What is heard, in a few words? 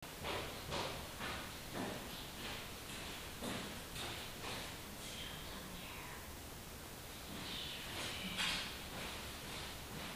static